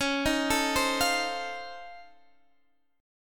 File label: F 7th C sharp; F7/C#; arpeggiated